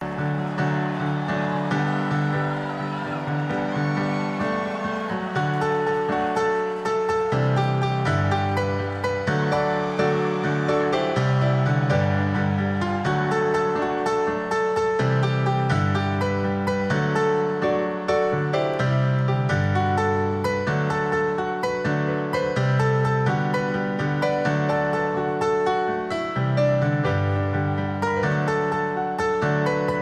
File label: Marco Solo